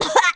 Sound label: Choke; Link